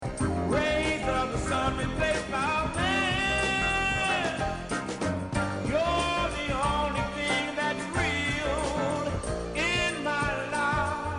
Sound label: synthetic more chords